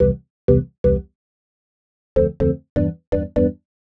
Organ chops (125BPM), retro house

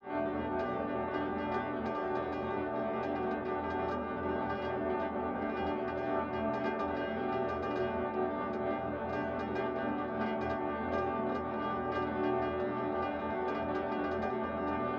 granular, pad; M8

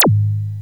Korg Z1

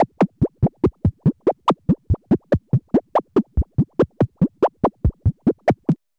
BEAT, RHYTHM; AMG - Vince Clarke Lucky Bastard

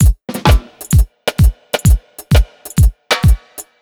130 jam, Ragga & Dub Reggae; USB Soundscan vol.27; AKAI

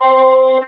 talk box choir oh

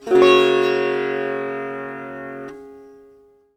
SITAR CHORD; SITAR CHORDS

